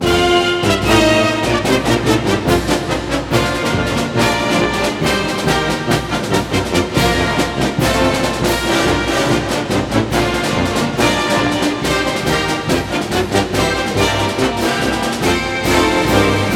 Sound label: Fight Song; NCAA Basketball 09; PS2, PS3, Xbox 360